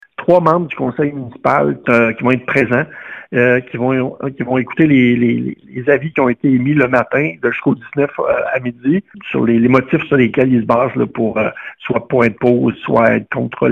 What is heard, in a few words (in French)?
bourdon